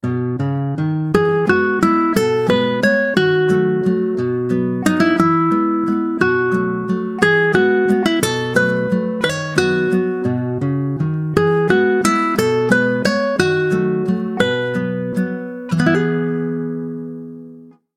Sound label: Classical; Coolpad Tiptop N2M